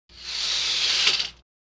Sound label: curtain